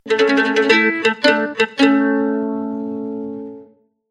Rise & Shine; Mudita Pure; bell; chimes

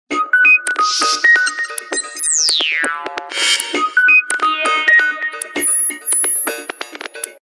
Crossing Tone; Samsung SC-01B

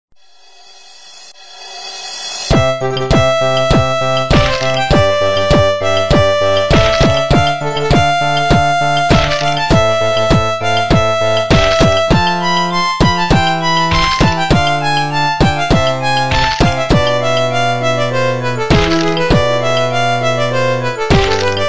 Violin, phonetones RingTone; LG; U8500; Tchaikovski

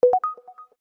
Maner mode; Samsung; Galaxy A SHW-M100S

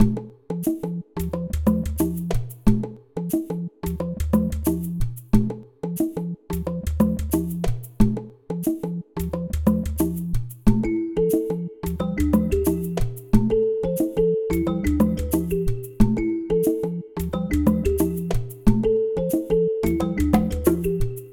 Tropical Rhythm, ringtones; Samsung Galaxy S26 Ultra

